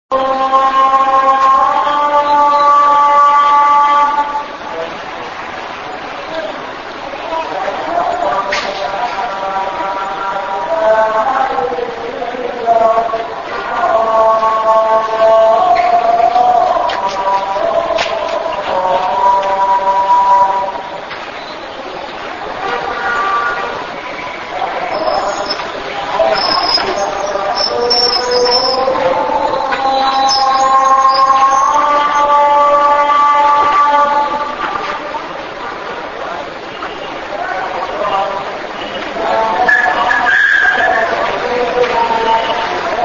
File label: azan, baalbek